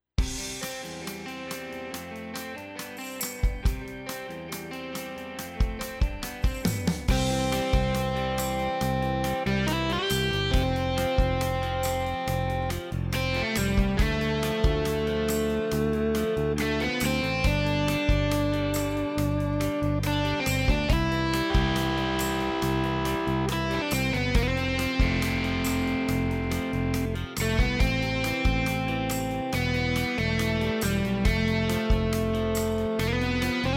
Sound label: Audio Style Canadian Rock; Tyros5